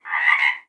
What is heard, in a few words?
frog, frog groups